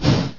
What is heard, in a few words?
Fire Punch; pokerogue; battle anims